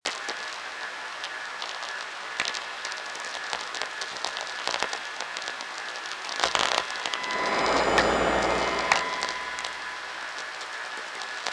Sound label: Car tweaks